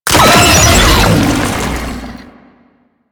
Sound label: Brachiolyst Death, Vocals; Enemies; Warframe; Proto Infested